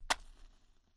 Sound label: back, binaural recording; theverge